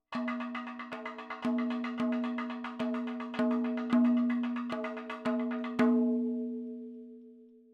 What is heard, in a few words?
110 7 8 MADALAM FILLS, percussion; Sample Banks; MADHALAM; MADALAM 7 8 FILES